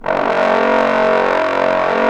BRS BSTBN, Bs.Bone Sect; Roland L-CDX-03 Disk 2; Bs.Trombones

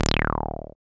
SYN 303, Roland TB-303

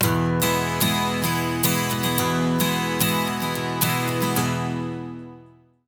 110 MAJ 12 STR, Acoustic Guitar Loops

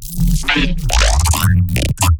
glitch stab; Virtual Riot; Glitch Stabs

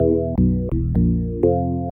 ORGN LICK, K E Y S

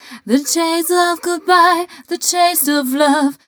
f mel d, fem Vocals

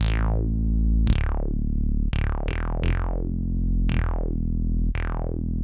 BSE, Hip Hop and R&B Drumloops; Best Service; ProSamples vol.01; AKAI

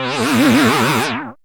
syn a, Dance Synths, Juno; Juno Jam

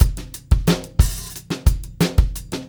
089, Beat Loops; Zero-G - Monster Beats

